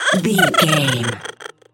goblin fairy laugh high

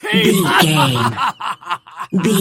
voice male laugh

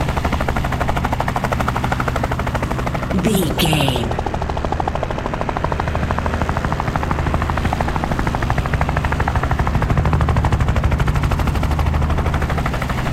helicopter blades spinning loop

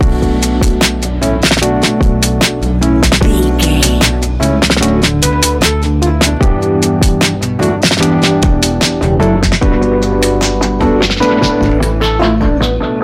A relaxed and chilling piece of music; A relaxed and chilling piece of music, great for light documentaries, background music for tv shows, lift

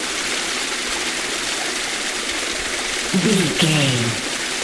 river stream flowing water loop short version

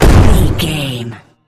gun tank cannon turret shot

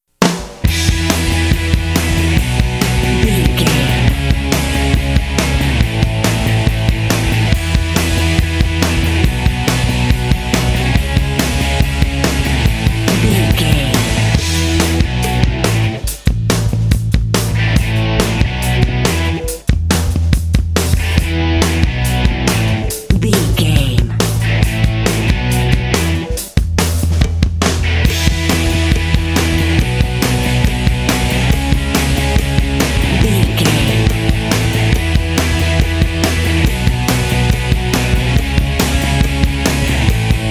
pumpin indie alternative rock energy bed mix

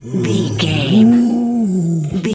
bear roar soft

